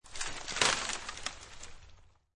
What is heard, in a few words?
Wood Crack Break, Wood crack break. Nicely defined sound of several branches breaking; Break tree.; Breaking Sound Effects; LynneMusic